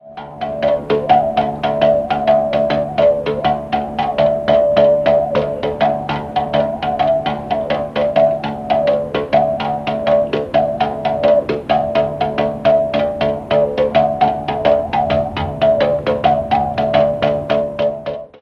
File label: Musical bow